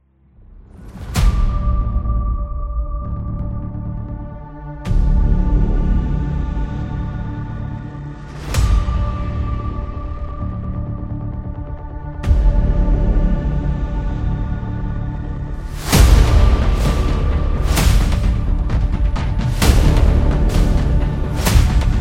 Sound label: Trailer Intro